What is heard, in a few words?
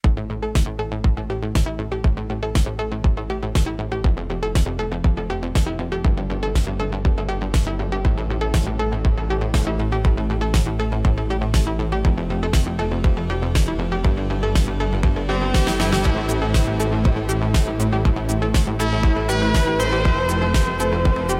Electronic Impulse